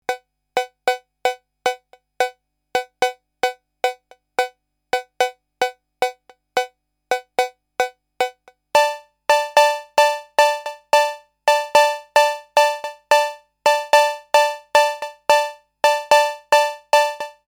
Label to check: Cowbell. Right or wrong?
Right